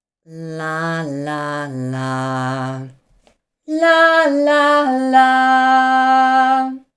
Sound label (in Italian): Melodia pesante e leggera; cos'è il peso della voce